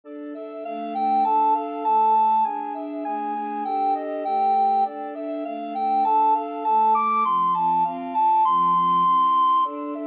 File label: grieg mountain king, recorder ensemble